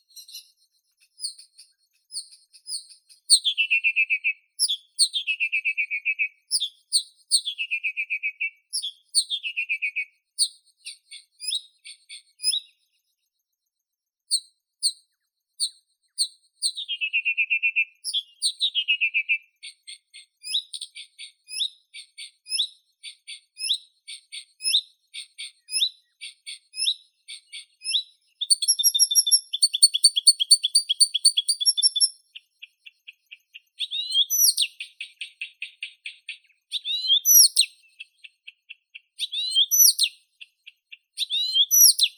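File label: blyth's reed warbler, acrocephalus dumetorum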